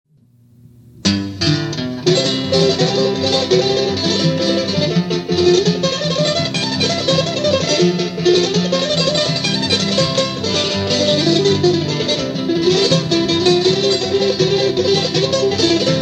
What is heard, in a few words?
(Instrumental)